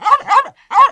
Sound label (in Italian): Cane